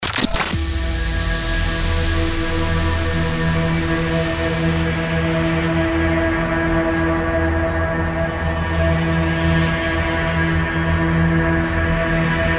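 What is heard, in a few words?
drone, 134 bpm, E minor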